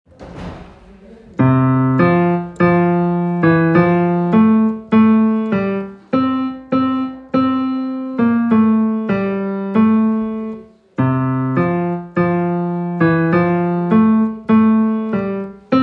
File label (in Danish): Hark the herald angels sing Melodi i F dur